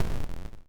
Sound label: Boom